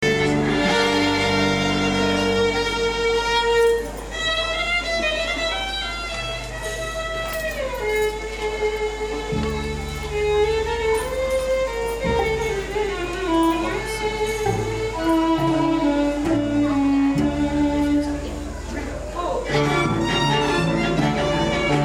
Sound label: czardas